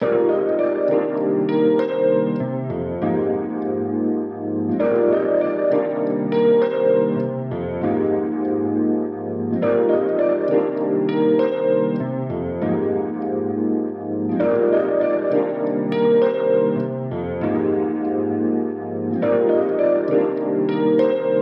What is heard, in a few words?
Electric Grand 80 Stereo